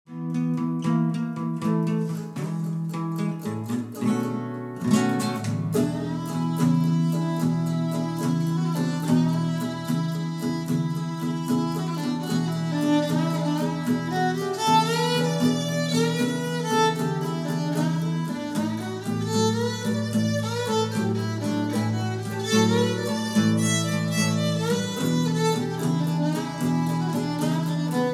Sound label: blues